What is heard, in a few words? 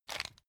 pepper mill; soundpool